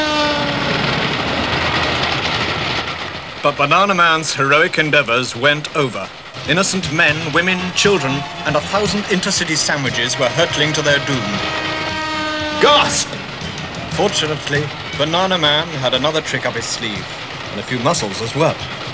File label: train; classictv; bananaman